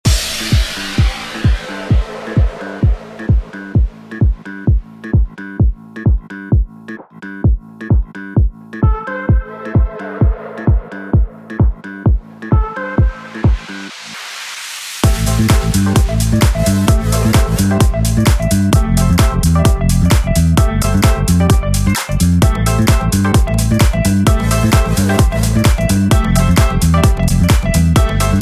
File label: Disco House Anthems